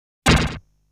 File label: rocks bouncing; Sausage